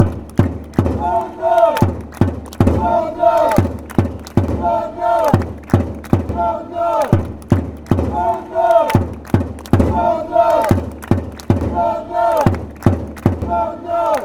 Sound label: japanese chant